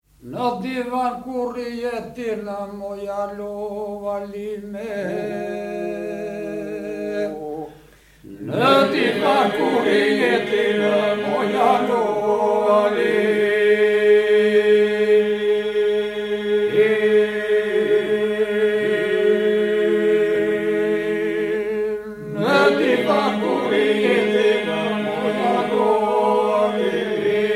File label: albania, polyphony